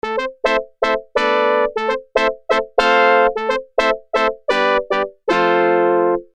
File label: FB01 Brass